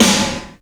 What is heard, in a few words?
yeppers, snares real